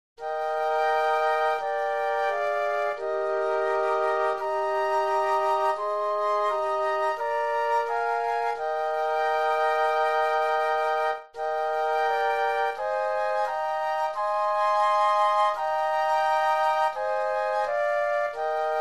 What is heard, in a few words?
Flute Trio